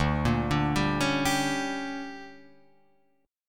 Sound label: D Major 7th Suspended 4th Sharp 5th, Guitar Drop D